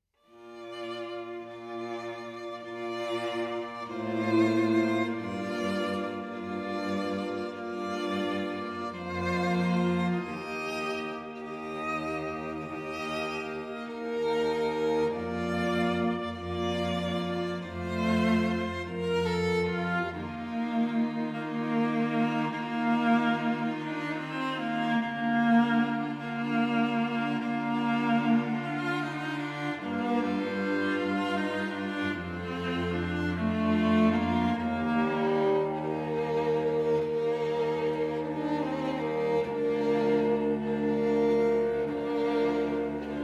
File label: quartet